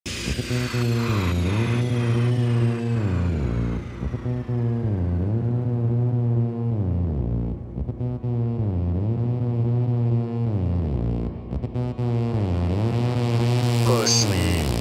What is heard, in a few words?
guaracha aleteo zapateo tribal house